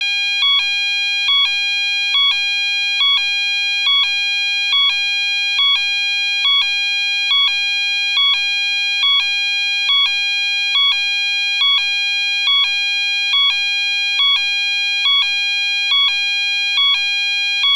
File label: DUAL TONE ALARM, Piezo Siren